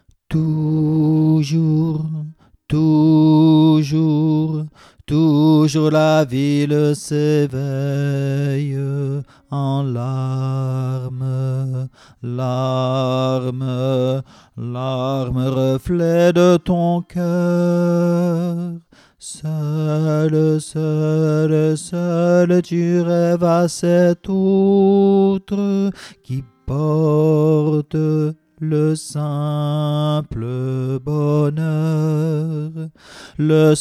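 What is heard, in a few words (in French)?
Toujours la ville s'éveille Alto voix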